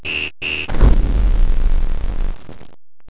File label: SPACE